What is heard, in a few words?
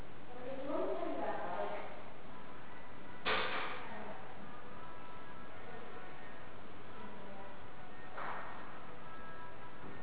music